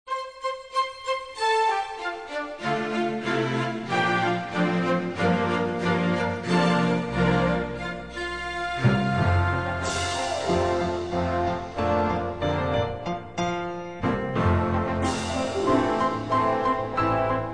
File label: Strings, Christmas